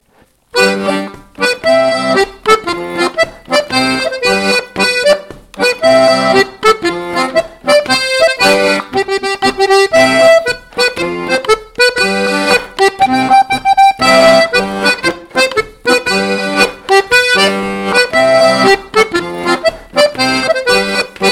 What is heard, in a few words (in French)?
voix 2; Rondèu de Saint Michel; Rondeau 2023